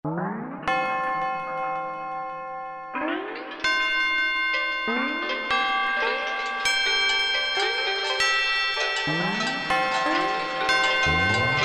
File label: Korg M1; Factory preset examples